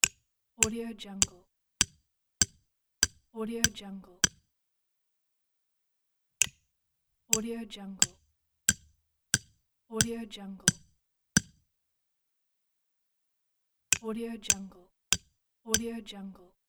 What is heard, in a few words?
hammering metal stakes into ground